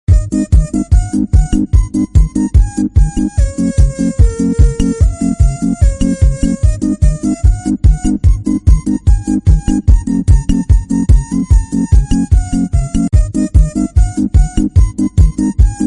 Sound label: fast funky russian train